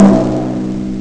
megabongo; Amiga Soundtracker Sample Packs

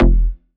Bass F, Bass Synth

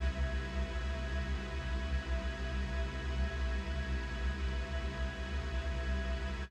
pad; M8

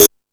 Discoball, E-MU Pro-Cussion

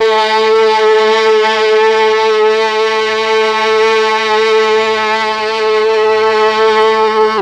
MEL STR, Legendary M400; ILIO; Propeller Island

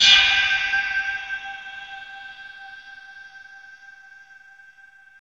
PHASED NOIS; Zero-G Ambient 1; MISCDIGIPERC

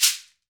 SHEKERE, Latin Shakers

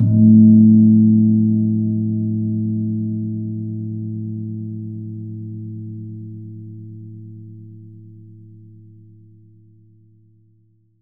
GONG A2; USB Soundscan vol.60; Asian Traditions; AKAI

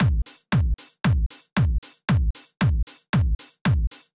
Drumloop 115bpm, Nu Disco; Zero-G

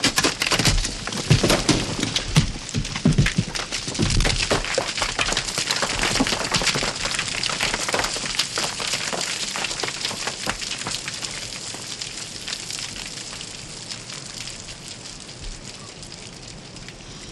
Rockfall, On The Glacier

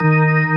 B3 FULL; E-MU Formula 4000 Series Vol. 5; Protozoa; Vintage Presets

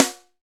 SNR, Snares; Roland - Rhythm Section; Snares 4; Sn Modules 4

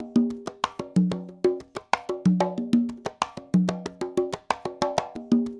LOOP, CONGAS, Percussion

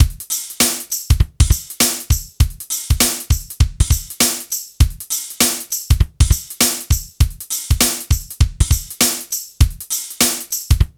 HIPHOP, Funky Xtreams; INIS

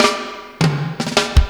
FILL TIMB, Ragga & Dub Reggae; USB Soundscan vol.27; FILLACOUS

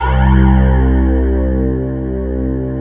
pole pad C2; NORTH PAD

